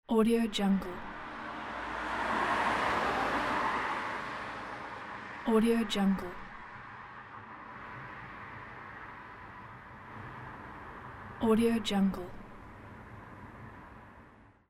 car

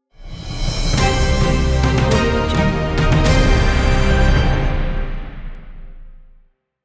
game victory epic fanfare

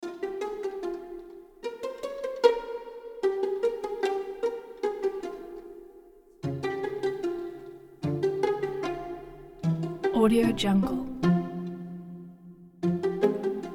playful mischief

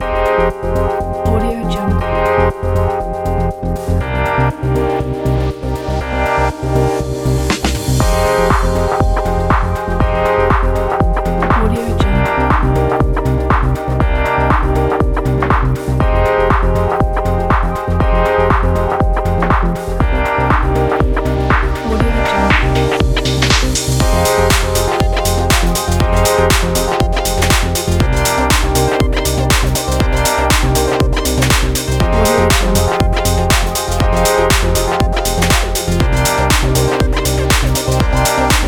upbeat house; mezzosound

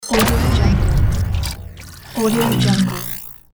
futuristic glitch transition

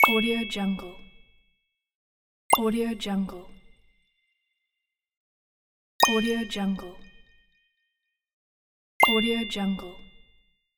sparkling pop bonus

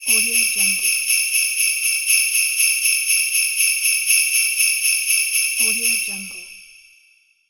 christmas bells

